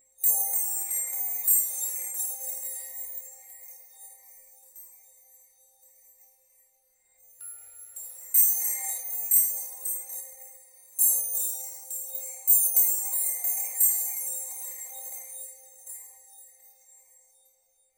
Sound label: twinkling light effects, bell chimes